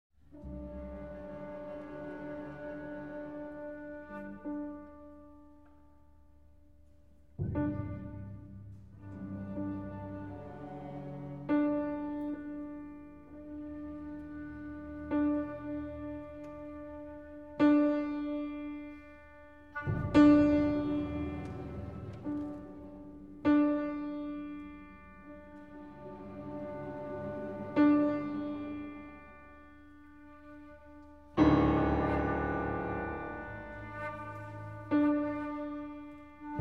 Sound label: Piano Concerto; Concerto for Piano and Orchestra 1993